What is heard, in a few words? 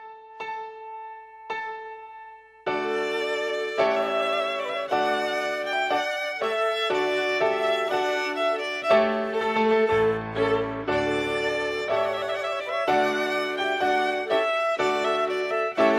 Trumpet Voluntary